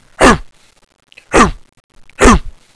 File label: dog bark